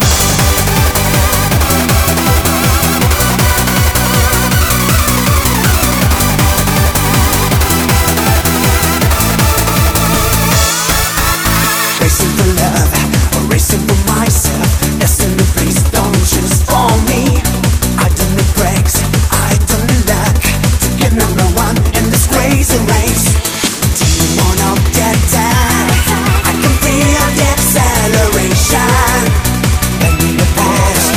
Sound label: Initial D Dream Collection Vol. 2